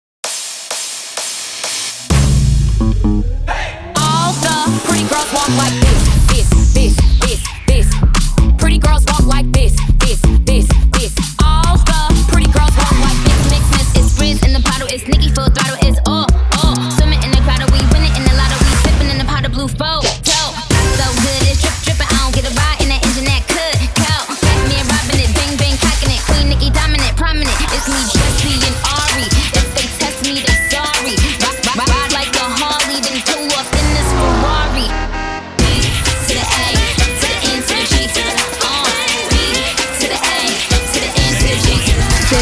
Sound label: Hip-Hop